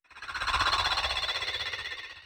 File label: robot death; enemydeath; gonner linux macos ps4 switch windows xbox one gamerip 2016